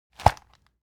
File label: object plastic plastic bag hit hard